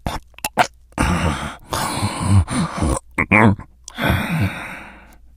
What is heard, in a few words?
idle breath, infected; npc; source engine; left 4 dead 2